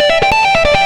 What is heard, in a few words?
xtra lead